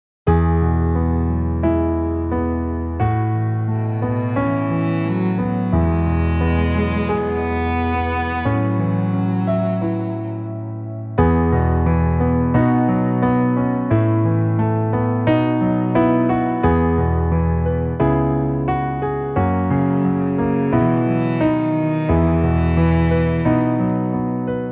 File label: karaoke; Hail O Star Of The Ocean